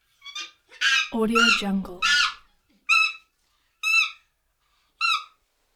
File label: chimpanzee screams